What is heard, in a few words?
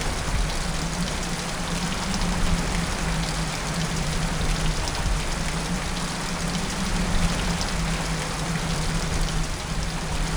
storm; prague; ambience; Soldier Of Fortune II Double Helix; XBOX